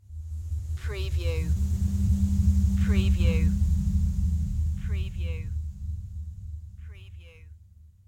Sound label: Ectoplasm Ghostly Presence